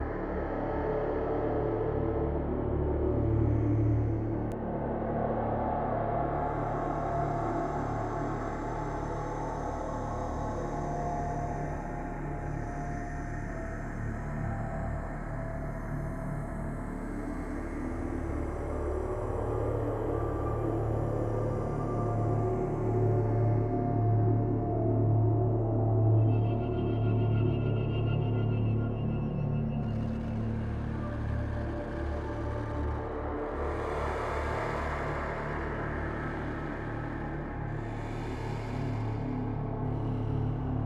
forest loop; leshiy forest loop

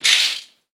Open Curtain

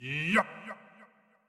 Wind Up Short; Going Under; Marv

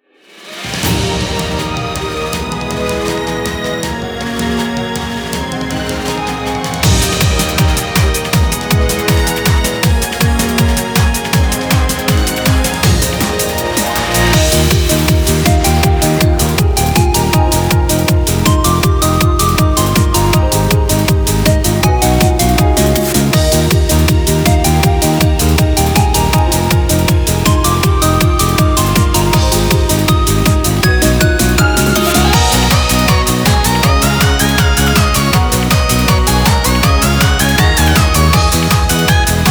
Bgm Bomb; Mahjong Fight Girl (Arcade) (gamerip) (2023)

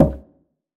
Move Large

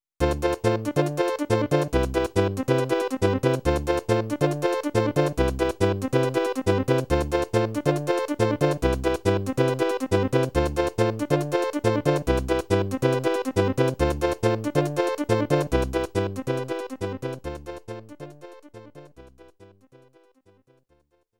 Invincibility BGM

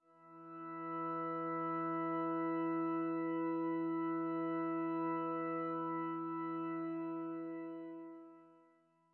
Church Organ; Grindstone

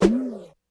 Jump; Jonah A VeggieTales Game

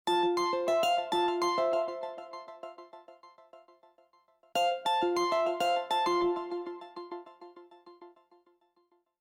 Ring; Windows 8 (Windows) (gamerip) (2012)

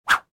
Whoosh high; The Binding of Isaac; SFX Slots

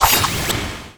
Enemy Teapot Saucer Attack Shoot; gamerip

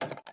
Grab Controlpad; Lethal Company

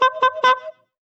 Whistle

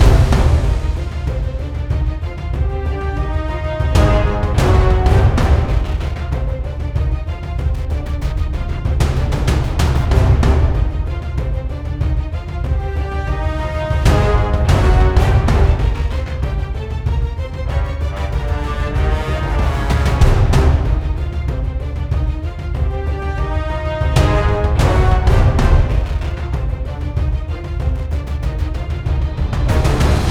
Action Loop

